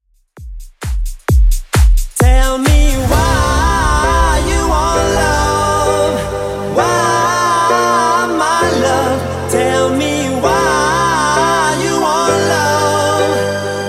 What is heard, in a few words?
90s, 131bpm